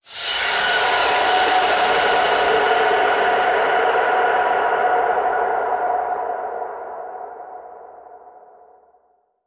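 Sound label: creature; Merpeople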